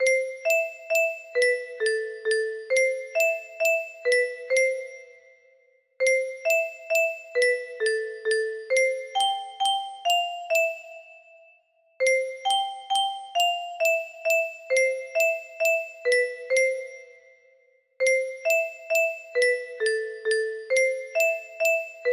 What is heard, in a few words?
music box